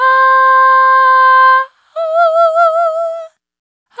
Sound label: Shared Faith Dry, vocal samples; Sonic Foundry (Sony Creative Software) - Pocket Diva World Class Vocal Samples; Op-Erratic Loops